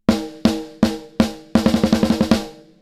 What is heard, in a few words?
Soft Snare Comp; Xtressor